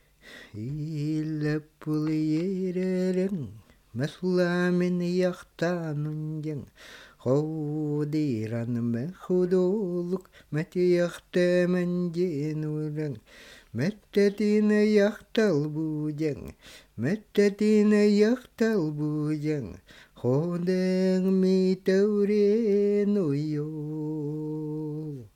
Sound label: Singing